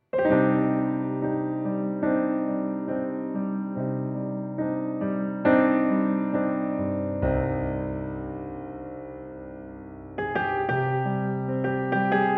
Piano Version

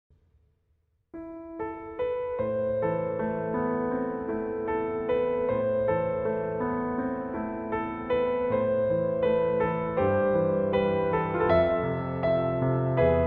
Piano

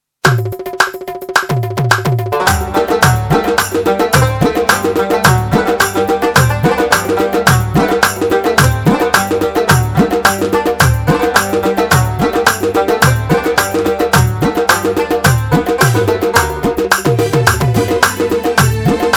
Remix

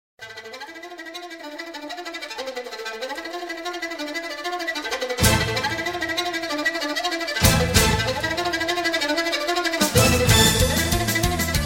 Instrumental

